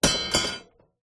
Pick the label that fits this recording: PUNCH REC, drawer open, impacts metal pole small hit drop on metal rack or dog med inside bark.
impacts metal pole small hit drop on metal rack